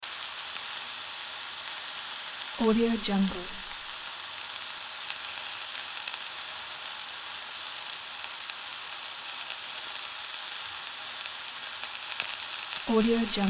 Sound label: sizzling